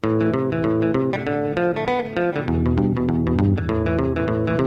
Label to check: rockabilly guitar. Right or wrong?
Right